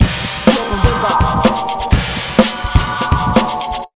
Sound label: beat, Electro 125, Big Beat Drumloops